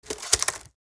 Wicker basket set down

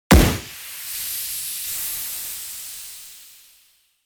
Smoke grenade explosion air hiss

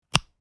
Plug insertion cable click; headphones and speakers

